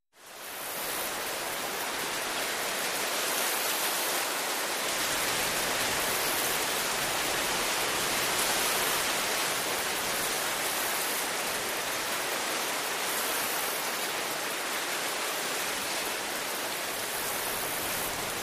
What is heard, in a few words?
Continuous Cicada; cicadas